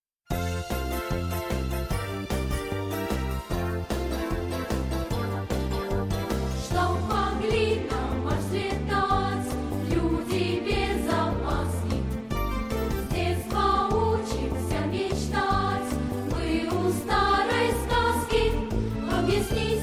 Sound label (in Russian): hor; golos detstva